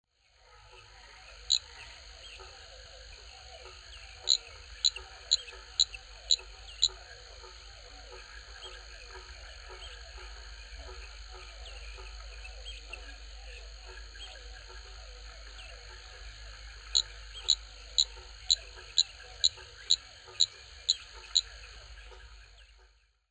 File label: Dendropsophus jimi